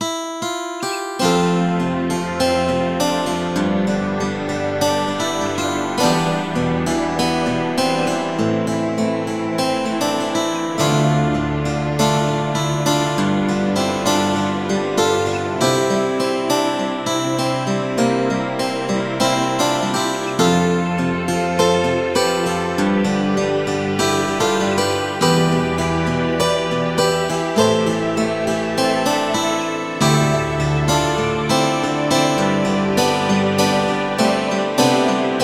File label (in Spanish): Flautas